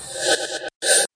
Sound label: breathy; percussive; REMIXABLE COLLECTIONS; Ze Franks Pain Pack; pain pack percussive and bips and clicks